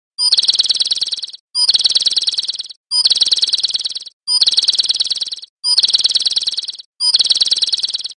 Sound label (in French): L’oiseau qui siffle